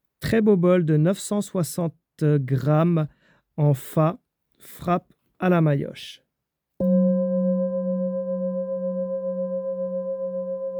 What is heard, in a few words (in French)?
Bol Tibetain Grave Martele 7 Metaux Note Fa